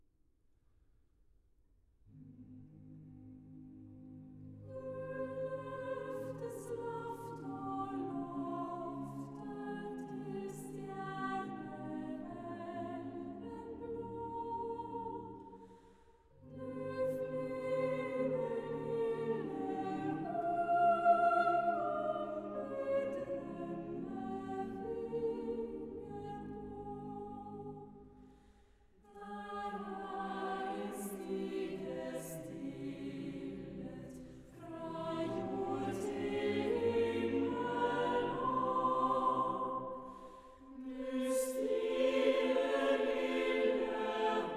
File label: Lullaby, romance for voice & piano; Margretes Vuggesang (Margaret's Lullaby); Grieg